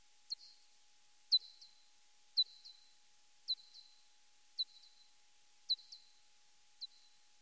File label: P. pipistrellus, bats